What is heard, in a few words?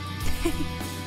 giggles